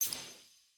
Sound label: click generic